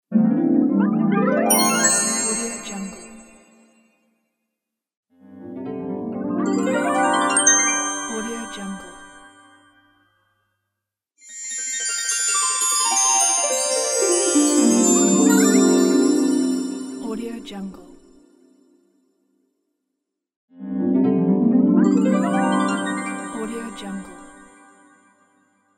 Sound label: magic